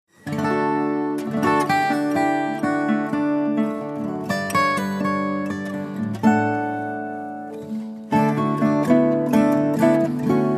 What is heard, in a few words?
Folk Tune